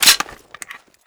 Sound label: reload clip out, foley; usp